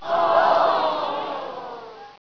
OOH, 人群; 人群系列声音素材

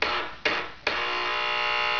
BUZZ, 警报

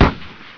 MAUSER, 枪炮声音素材

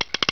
LOAD, 枪炮声音素材

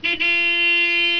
HORN, 汽车; 交通

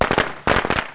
CRACKER, 爆炸系列声音素材

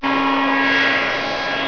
HAIRDRY; 机器

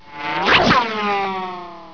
RICO, 枪炮声音素材

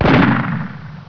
MUSKET; 枪炮声音素材

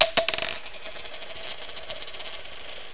COIN SPIN

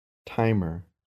timer

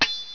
sword

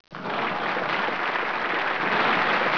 CLAP, 人群; 人群系列声音素材

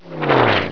cannon, 爆炸; 爆炸系列声音素材

